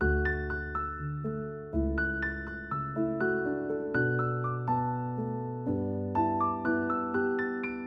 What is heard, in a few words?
Dmaj 122BPM Piano, Music Loops; 99Sounds; Monosounds; PANDORA BOX!; Coconut Coctail